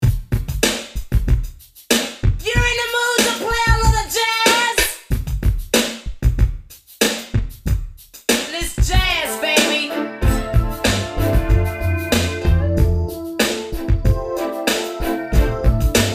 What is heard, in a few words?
Jazz; ECW Hardcore Revolution; Anarchy Rulz